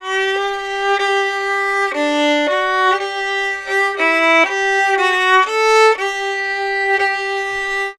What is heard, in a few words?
Fiddle, Appalachian